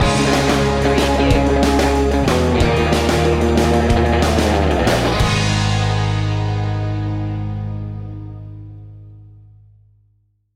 stinger; twangy guitars

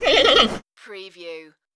creature talk; growl

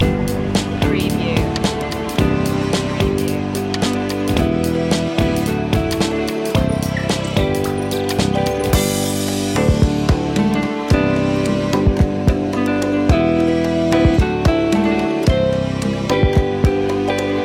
ocean life loop joiner; rainforest

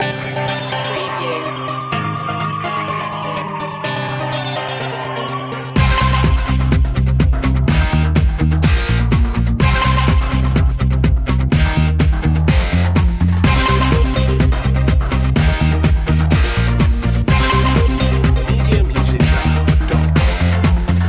crazy ride with sound fx; race

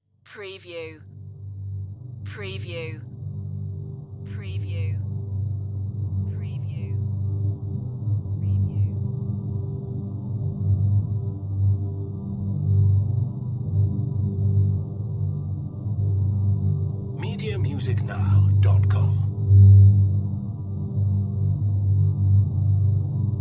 Drone Bed Atonal, space science fiction; lynne-publishing

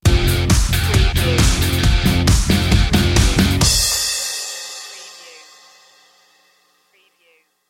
metal meltdown no sfx no vocals

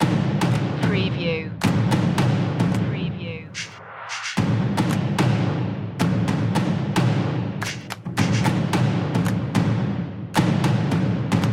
urban beat drums underscore

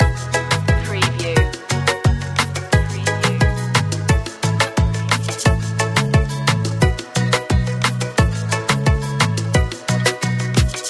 summertime kiss loop, dancehall

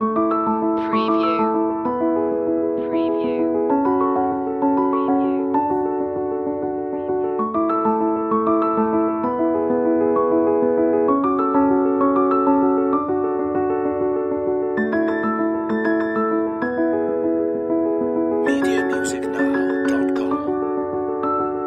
joyride loop, romantic love emotional

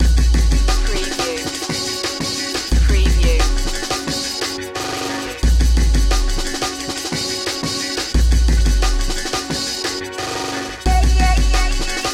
fast loop d, drum n bass

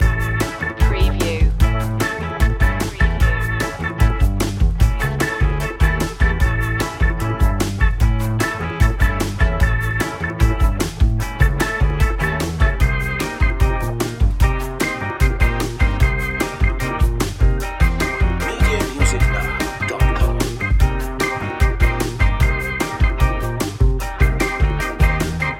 funny fight loop, brisk

